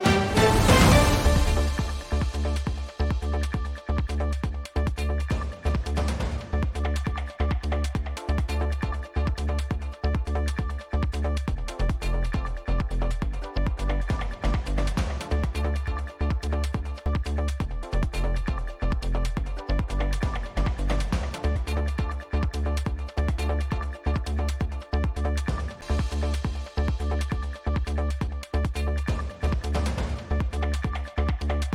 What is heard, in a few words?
RADIO BED